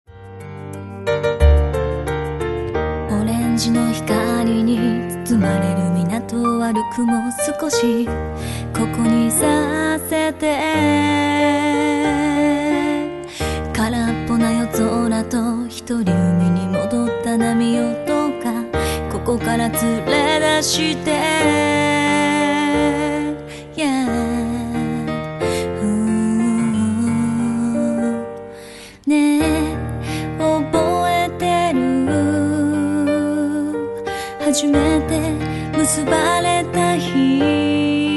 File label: music